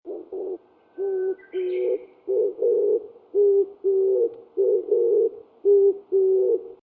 kiji-bato

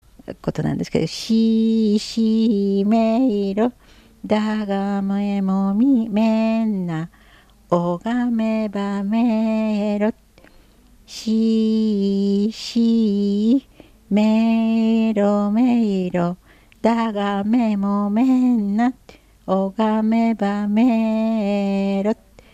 siinouta, minyou; 長生村; しいの歌

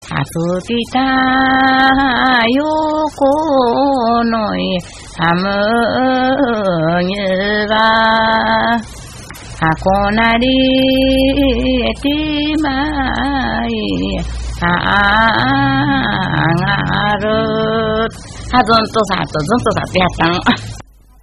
mugitukiuta, minyou

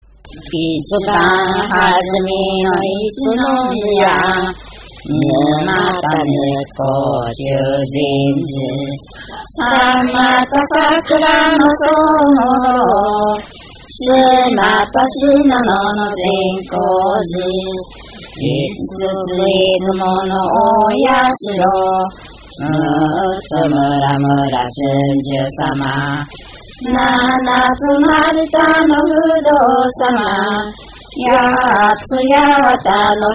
itibanhajime, minyou; 八千代市; 一番始めの

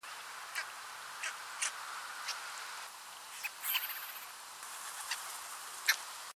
kinkurohajiro

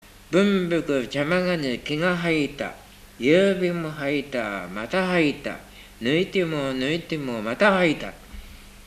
warabeuta

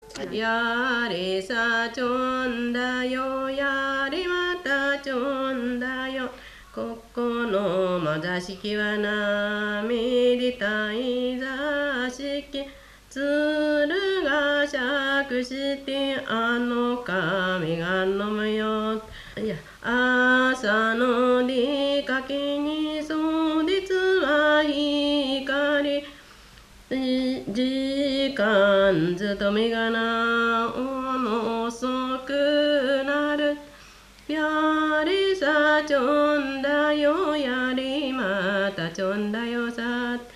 takonometubusi, minyou

